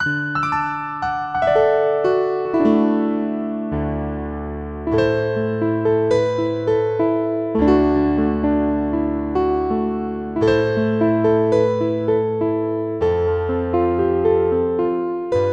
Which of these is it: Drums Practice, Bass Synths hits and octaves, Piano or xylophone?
Piano